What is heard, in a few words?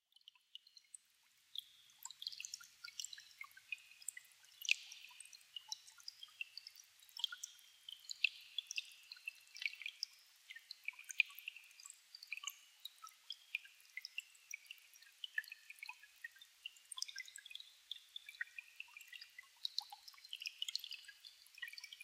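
water sound; mizuoto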